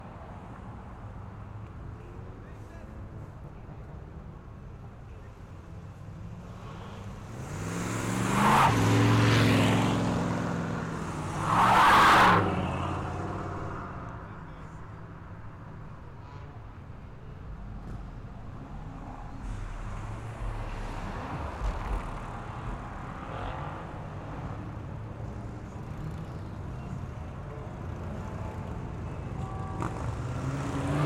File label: Carshow Cars Accelerate Crowd